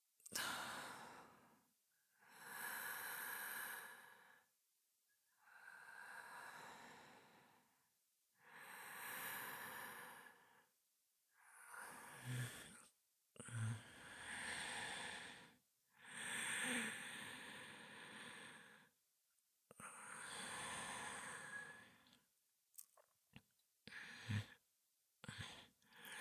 寝息; Ghost

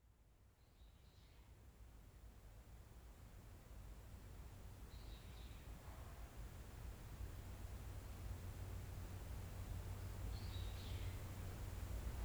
左耳耳かき

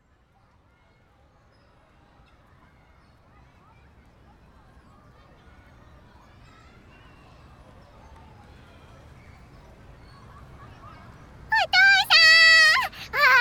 理容室、シャンプー体験

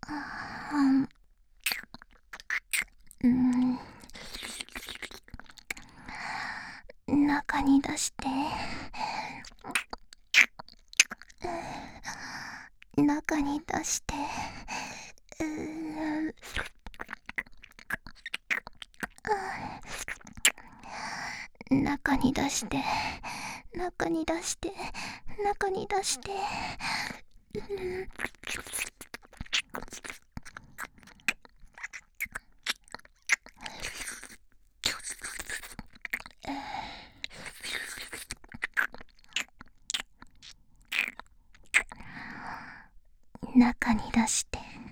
耳舐め; 中に出して♪; 夕貴

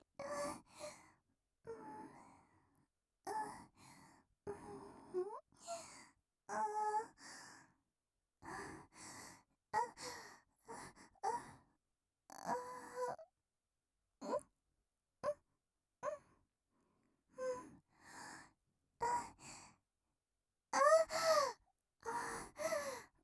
喘ぎ・人間っぽく; 台詞系

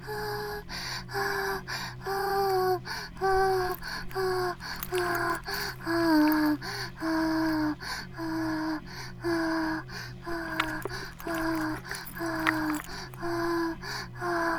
バイブ放置, ASMR

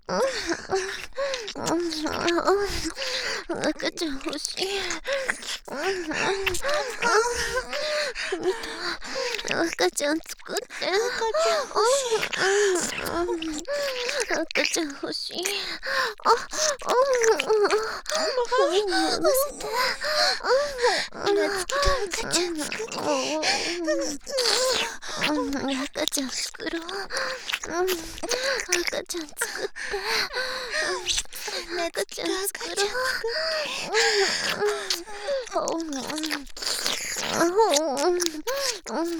耳舐め 喘ぎ, バイノーラル; ハーレムオナサポ 4人のメイドがご主人様のオナニーをお手伝いするバイノーラル～文、千曜、名月、莉桜～; 赤ちゃん作ろ♪

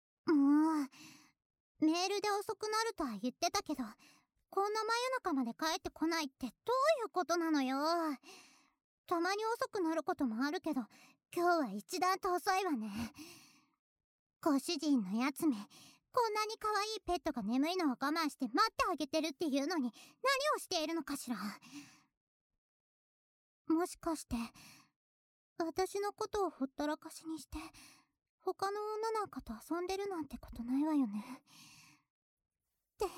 ディープキス&耳舐め, ASMR; クレアちゃんのエッチなご奉仕

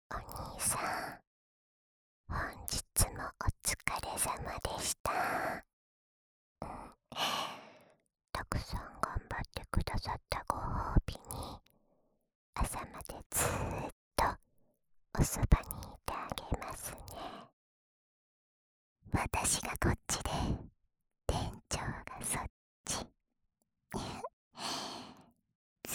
安眠用吐息たっぷりの添い寝; ダブルで6時間; 耳かきリフレ『春乃撫子』へようこそ♪～耳かき・洗髪・耳舐めのデラックスコース♪

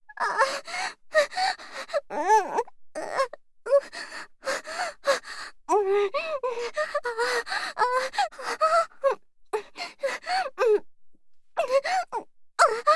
喘ぎ声; ヒカリ

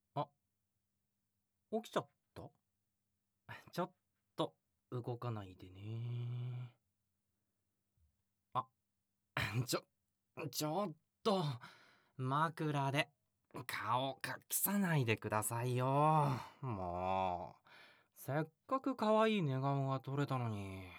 耳舐め, クンニ; まだ時間あるし(耳舐め,乳首責め,バック,クンニ)

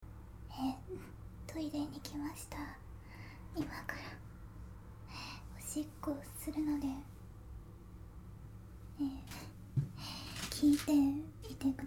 おしっこ実況（勢いのあるおしっこ）

